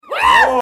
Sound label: screaming